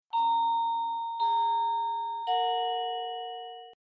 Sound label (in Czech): melodie